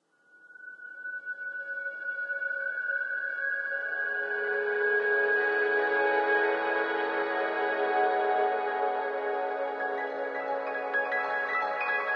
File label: Village Sting; ether one redux gamerip 2015